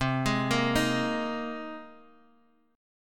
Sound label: C Minor 7th Sharp 5th, Guitar, Open G; down; chord shape